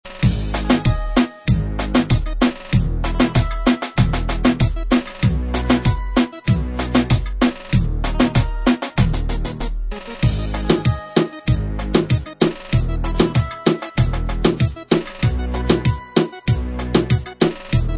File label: Reggaeton Beat